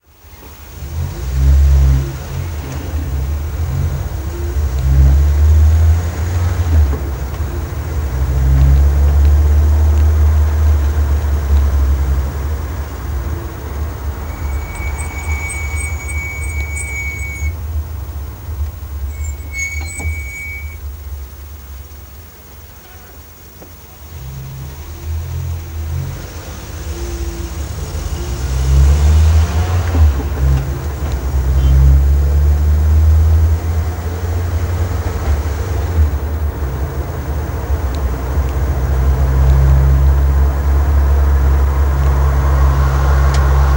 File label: R4 Squeaky Brakes, Renault 4